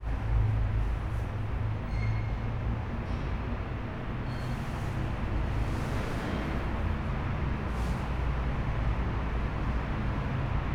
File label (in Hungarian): főútvonal forgalma érkező lift, lépcsőház; angyalfold